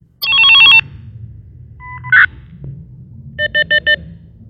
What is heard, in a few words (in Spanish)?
Radio Comunicador Tono